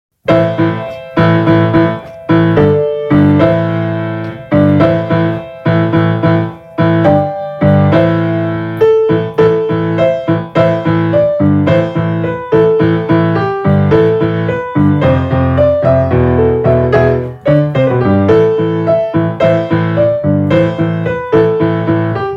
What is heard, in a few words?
Power Rock Piano; God rest you merry Gentlemen